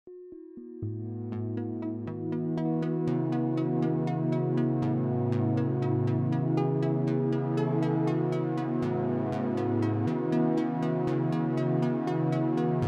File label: Deckardsdream DRY